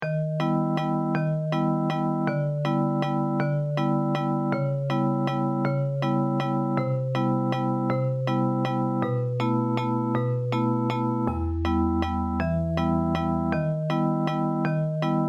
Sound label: simple waltz